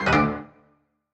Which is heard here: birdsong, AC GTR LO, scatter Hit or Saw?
scatter Hit